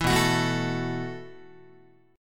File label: B 7th Suspended 4th B down Guitar